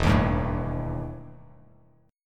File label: E Minor Major 7th Double Flat 5th E Bass Standard